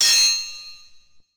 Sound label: swords